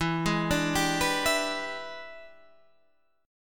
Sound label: E 7th Sharp 9th Guitar Drop D, chord